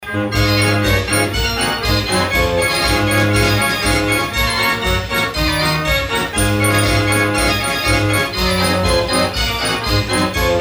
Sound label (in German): drehorgel; hinzens drehorgel